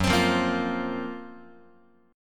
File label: F sharp Major Sharp 11th F sharp, Guitar; F#M#11 strummed; 4A,4E,5A,5D,5G#,6C#; chord shape